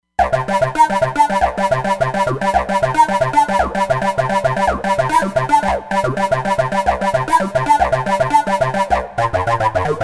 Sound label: TB Wah, Roland Fantom XR